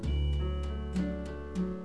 Popup

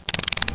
move Beetle; POOL CHAMP

